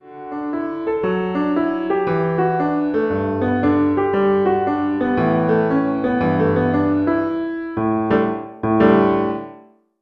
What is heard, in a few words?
intermediate piano solo